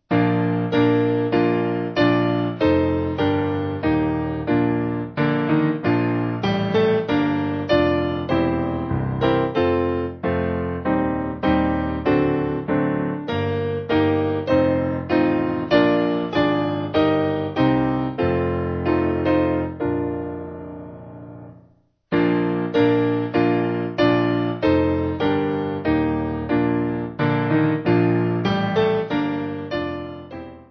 The Lord Is King, Piano

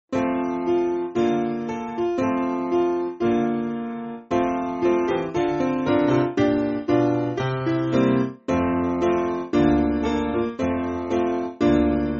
Piano, church music; He Is Born; Il Est Ne

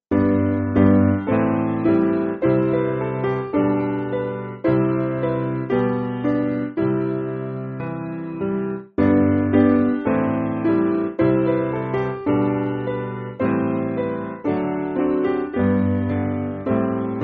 Live In Me Piano

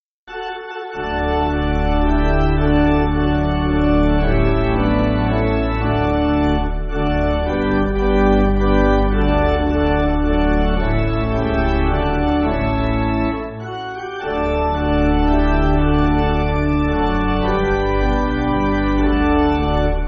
Pipe, church music

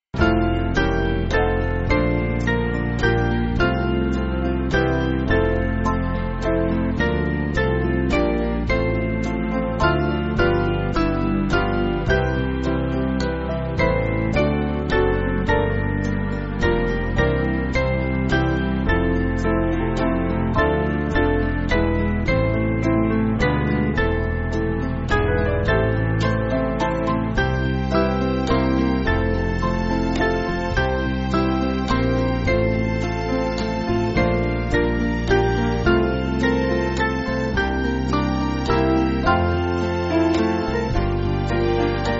Band, church music; We Have Not Known; Pater Ommium

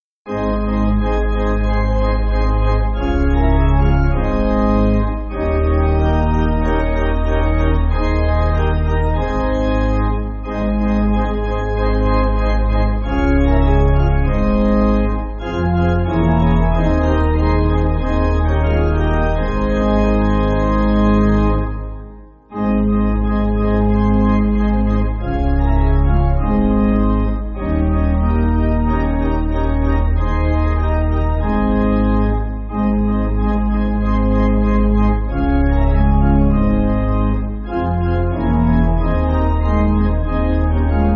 Happy Are Those, Pipe; Rabary

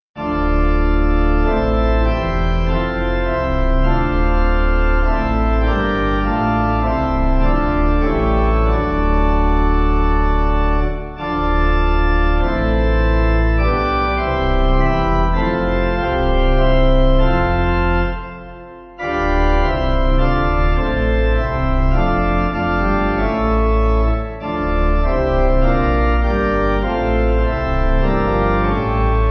Chant Pipe; Organ; 8.8.8.8.8.D; We All Believe; Wir Glauben

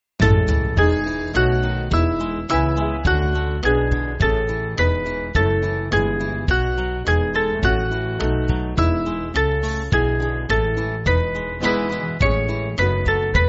Band, church music; Strengthen For Service; Ach Gott

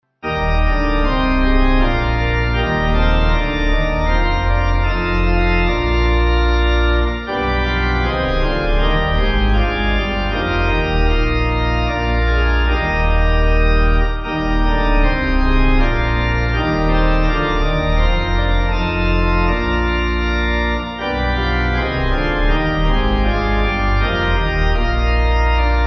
Pipe

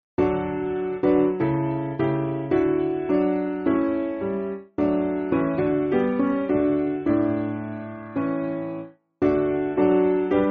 Piano, church music; Holy Spirit Hear Us; Pastor Pastorum

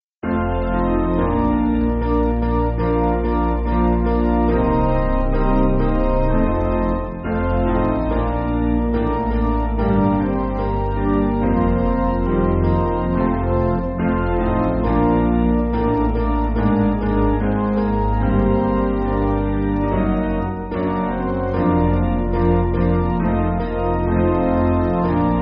spiritual piano; We Shall Walk Through

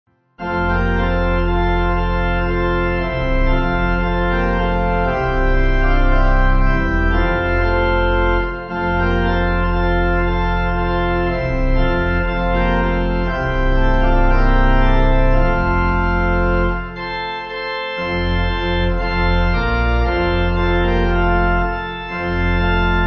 O Land Of Rest, Pipe; Miller

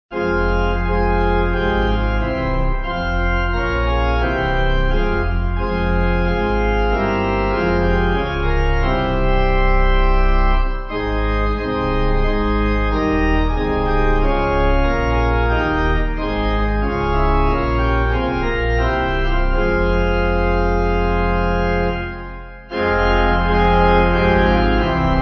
Pipe; In Suffering Love; Walsall

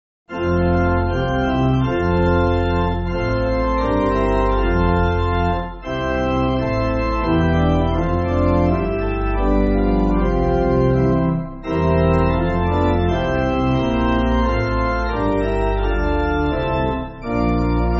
Pipe Organ, Backing Files for Churches; Holy God Thirsty People; Glaubensstimme

